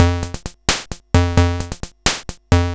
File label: Ballad, Hing-Hon EK001